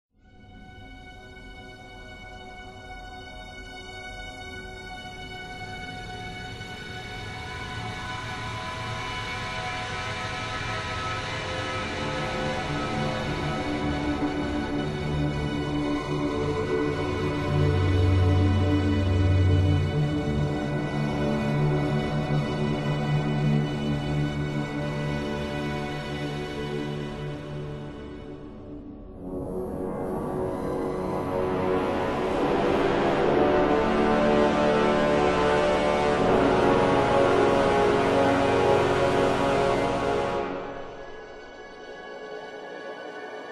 Calm

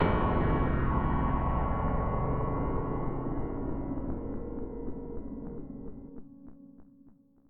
Dread sting; minecraft story mode gamerip 2016; Order Up!

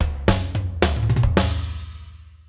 Drumriff

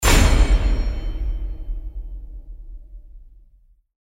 short drums; crysis warhead

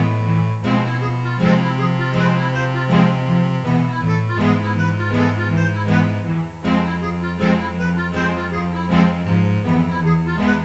Menu Loop

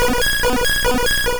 Bubblebeam; Pokemon; Gen 1; attack moves; RBY

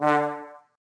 D Trombone; Notes; Scratch

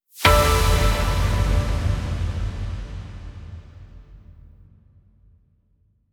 Awakening Jingle

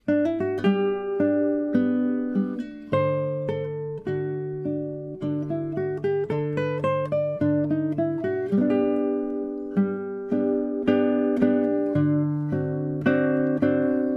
Guitar; Assassin's Creed Syndicate

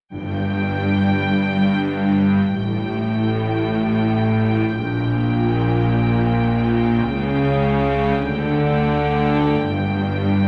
Bridge Intro music; Alien Swarm Reactive Drop; Windows gamerip 2017

